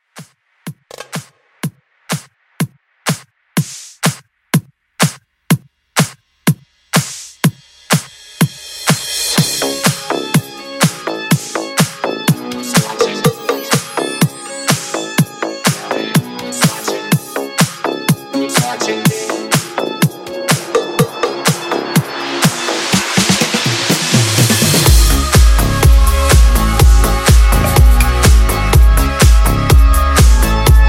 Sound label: Afro House Rework; Remixfreakz